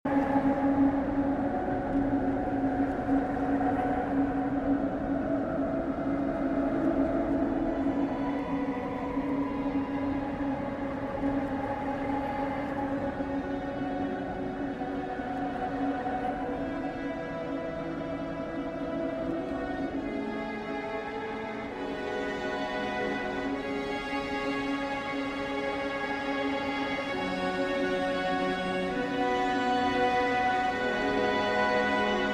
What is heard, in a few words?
a Waltz in C Minor